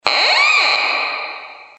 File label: door